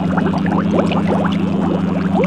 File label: Jellfish Loop